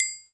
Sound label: agogo; hi; drums single hits; Western and Latin Percussion